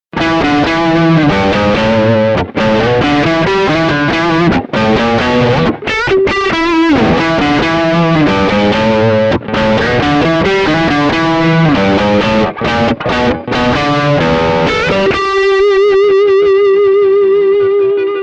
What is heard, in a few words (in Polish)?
Przester, classic strat single